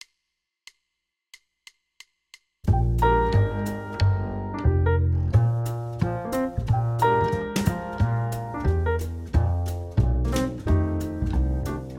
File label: Solo playback 180; voodoo horns